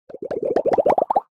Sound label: Stylized Bubble Pops Ascending; SoundsCrate